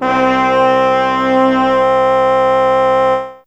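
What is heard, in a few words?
Brass Section 1 C3, Fairlight CMI IIe Brass; Brass2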